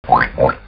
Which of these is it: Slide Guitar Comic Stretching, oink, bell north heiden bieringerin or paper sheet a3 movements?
oink